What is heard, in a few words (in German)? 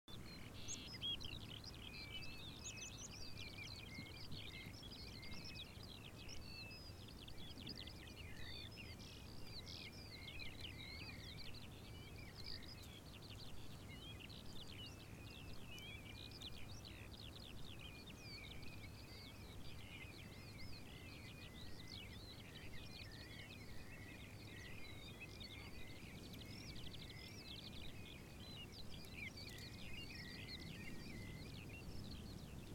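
Wiese mit Vögeln; ATMO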